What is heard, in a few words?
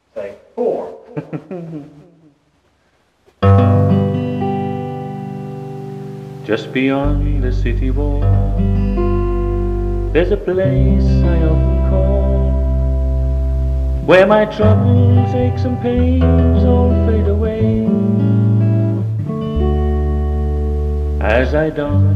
anthem; barnwood